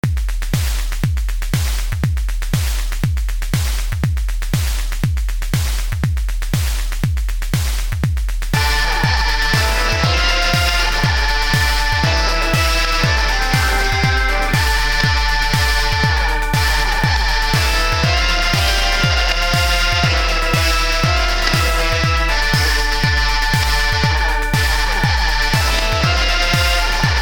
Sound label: barka tron